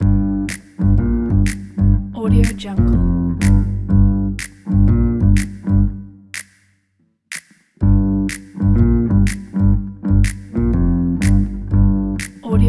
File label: cartoon crime underscore; frodonut